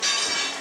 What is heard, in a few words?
Metal Pipe Being Dropped